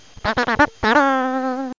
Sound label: FANFARE; Krusha